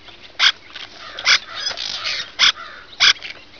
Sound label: swallow cliff interaction